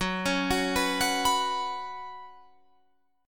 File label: F sharp Suspended 4th, Guitar, Drop D